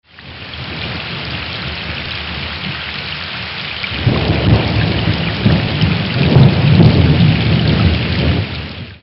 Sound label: Thunderstorm in the Wilderness